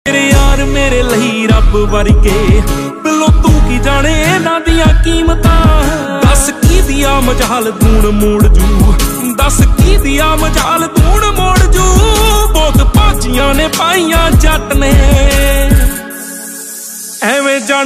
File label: friends ringtone